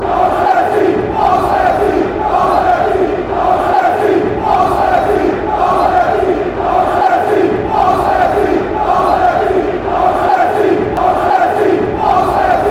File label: away win, chants